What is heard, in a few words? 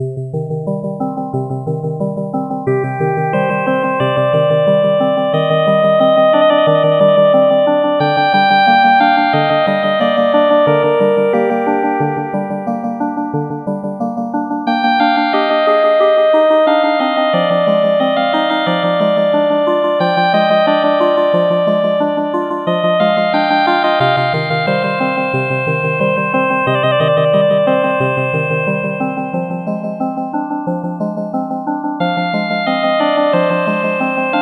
melancholy town